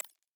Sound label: Ui Popup button hover Stereo